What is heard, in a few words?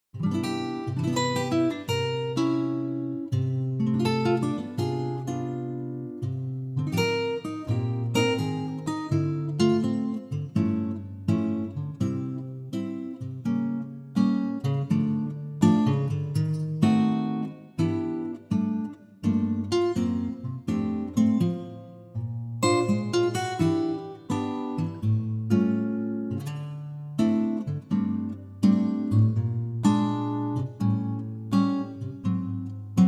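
C acoustic guitar; key - C; vocal range - C to D; Wonderful acoustic guitar intimacy; light swinging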